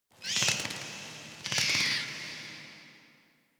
Interior Cave Bat; Warframe; Ambience; Duviri

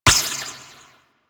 ricochet; Warframe; Cowgirl

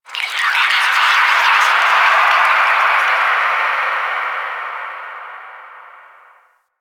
Moon Whispers Small, Ambience; Warframe; Orokin

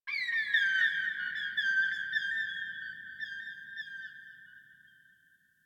Birds High, Ambience, Plains; One Offs; Warframe; Eidolon Plains; Eidolon Landscape

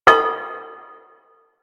Kid Glass Tap; Ambience; Props; Void Kid; Warframe; The New War; Zariman; Quests

